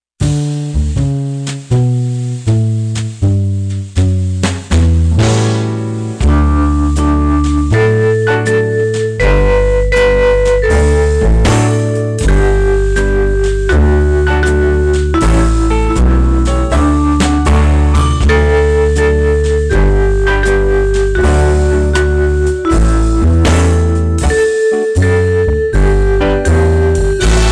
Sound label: Twinkle, String Songs